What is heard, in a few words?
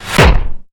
trailer hit fast enclosure short organic slam violent